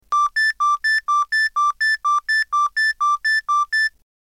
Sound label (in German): FF Alarm